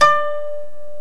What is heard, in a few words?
DULCIMR KOTO; OMI - Universe of Sounds